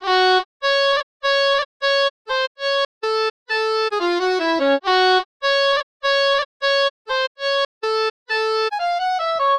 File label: Melody Loop BPM 100 F; Gully Loops